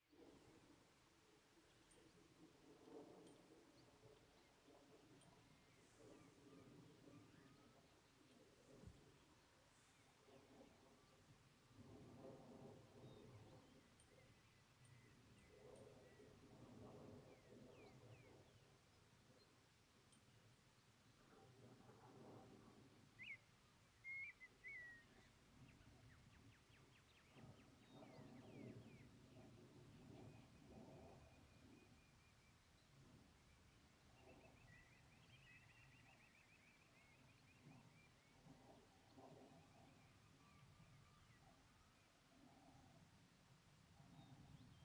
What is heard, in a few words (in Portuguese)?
Aviao Passando Bem Longe no Ceu desde Trilha de Campo Aberto com Passaros; Vento